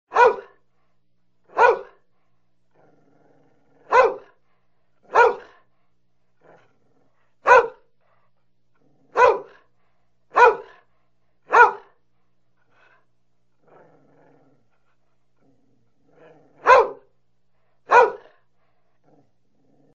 dog barking ringtone

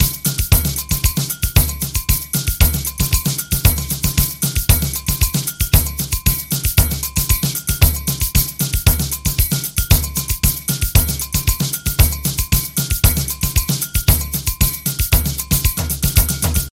samba drum beat ringtone; i phone 7

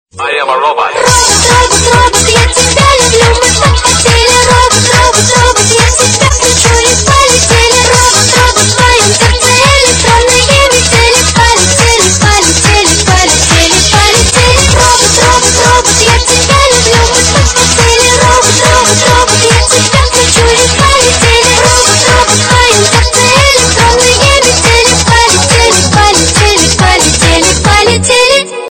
robot ringtone

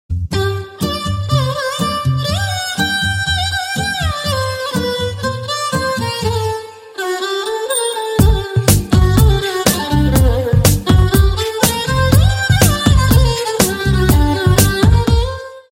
violin song i phone 8 ringtone

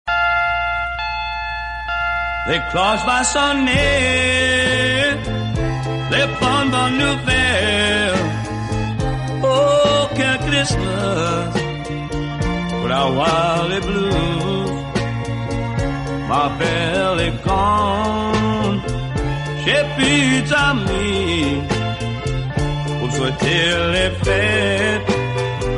iphone 8 plus french ringtone

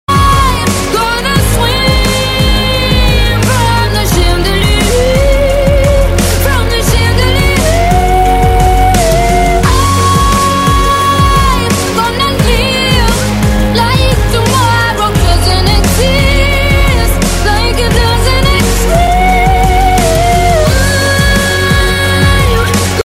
remix ringtone; iphone x

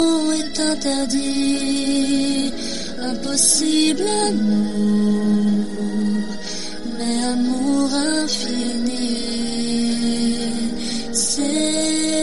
beautiful voice iphone se ringtone

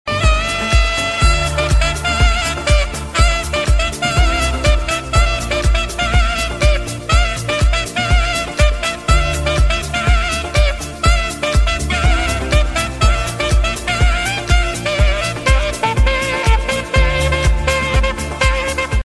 fast saxophone ringtone; iphone 6s plus

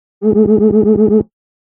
iphone 7 whubba ringtone

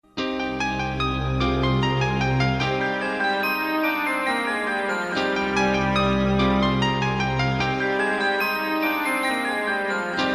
nice piano ringtone; i phone se

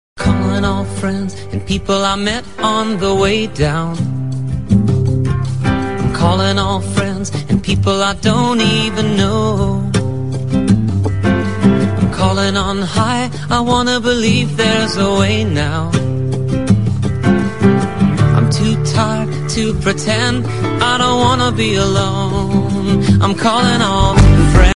ringtone; oppo

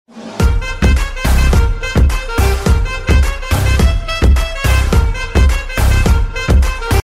instrumental

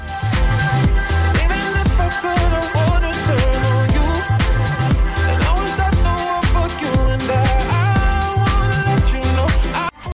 remix ringtone; iphone 7 plus 2018

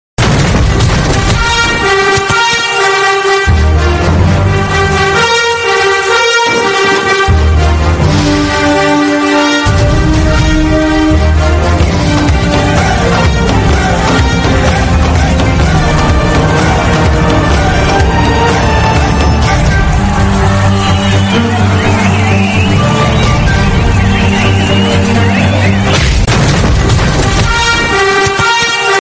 bgm theme music ringtone; mersal; vetri maran

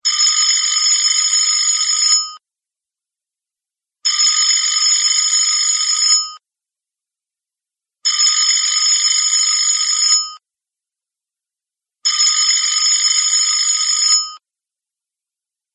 fast bell ringtone; iphone 3g

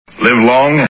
huawei ringtone

